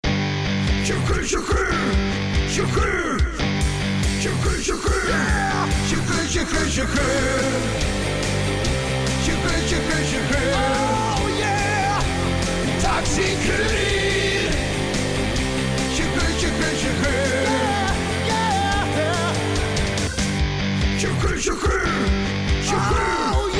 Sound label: Taxi Kurir Rock